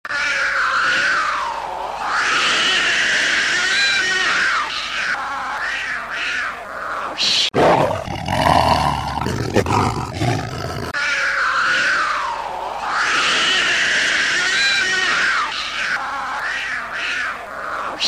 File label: Tiger Kitty; halloween